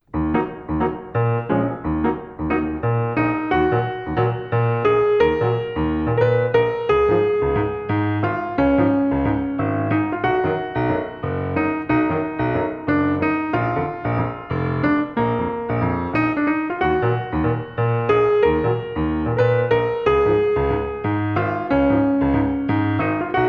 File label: Greensleeves Jazz